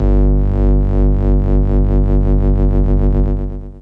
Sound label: BassStation Res Wobble Vinyl Synths G0, vinyl bass